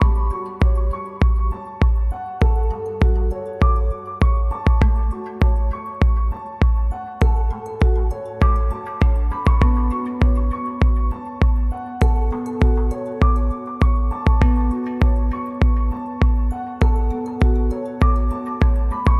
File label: interlude2 loop 100bpm